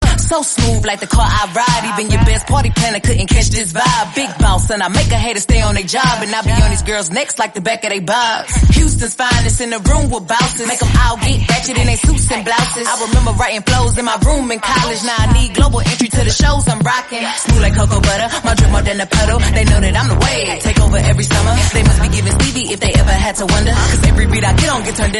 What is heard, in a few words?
Korean Song